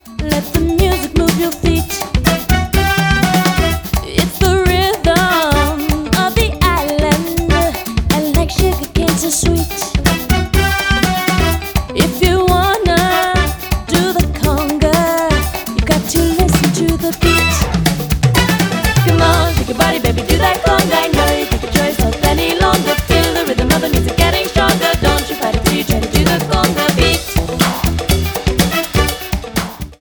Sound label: Conga